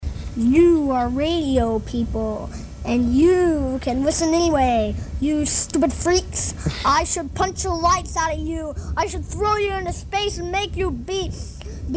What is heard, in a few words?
Piano Concert